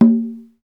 conga, Roland; Club-50 - Foundations Roland; Hybrid Kits; Thin 5

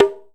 shime, Drums & Perc; AKAI S6000 CD-ROM - Ultimate

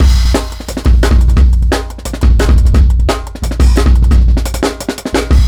Shook Beat 4-Bar, Drum n Bass Beats; KJ Sawka; Drum n Bass & Beyond

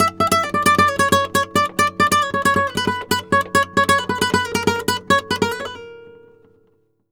gta a#, Flamenco, Guitar, Picado

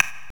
rev block; Greytsounds VOL-1; PROCESSDRUMS

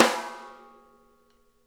RIM, SNARE; Sonic Reality; Interactive Drum Kits

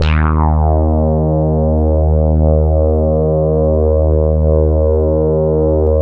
2600 bassics E, vintage keyboards; Big Fish Audio; First Call Vintage Keyboards

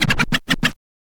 scratch short, Vinyl scratches

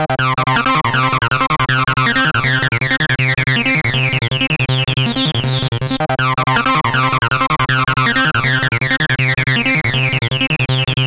Acid Loops, 160; Dance Synths; Hardcore Loops

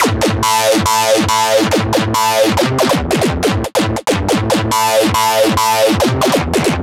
140 Am, Melodic Loops; M8; Electronisounds

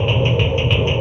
VALVE, MACHINES

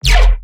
bass hit Happy Whomp F; Virtual Riot

